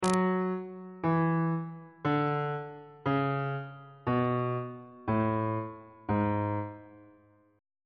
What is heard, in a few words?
g sharp locrian mode descending on bass clef